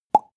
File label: plop; soundpool